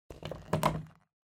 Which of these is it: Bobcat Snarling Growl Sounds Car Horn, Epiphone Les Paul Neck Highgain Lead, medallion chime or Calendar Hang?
Calendar Hang